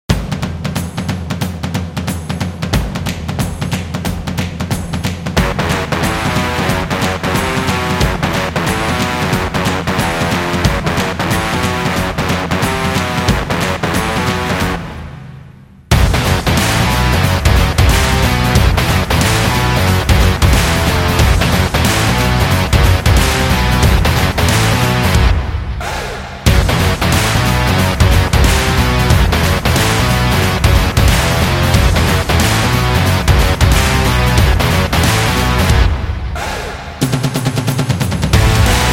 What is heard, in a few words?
Stadium Indie Rock